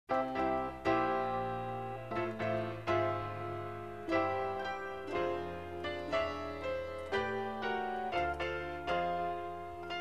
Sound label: jingle bells